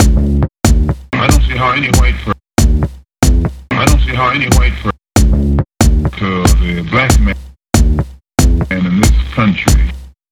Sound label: Hip Hop